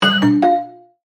Multimedia Alarm, Technology, Multimedia, Alarm, Element; Alert Sound Effects; SoundEffects-BlastWave